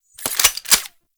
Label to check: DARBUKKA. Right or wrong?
Wrong